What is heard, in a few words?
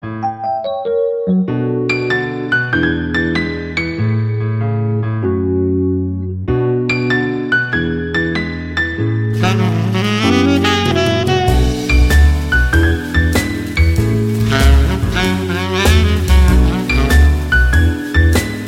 jazzy; dolce vita